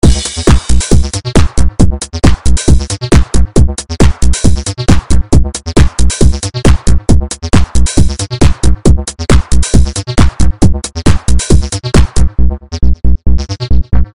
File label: sh101 riff; orion